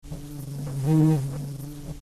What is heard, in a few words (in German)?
hummel im vorbeiflug; salamisound